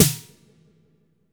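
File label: stereo snare, hi-tech kit; 90 sSampleCDs; Crypton - DrumBase5000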